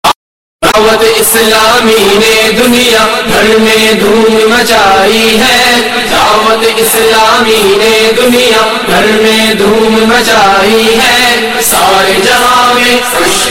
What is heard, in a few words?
islamic qawwali ringtone